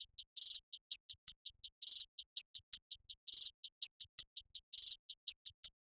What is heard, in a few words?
165 hihat loop; THELABCOOK; squid